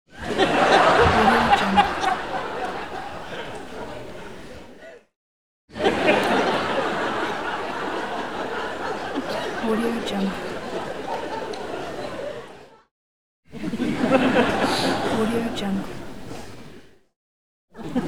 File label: laugh audience